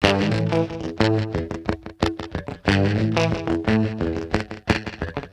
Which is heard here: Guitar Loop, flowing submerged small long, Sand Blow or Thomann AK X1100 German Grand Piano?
Guitar Loop